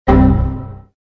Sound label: error computer crash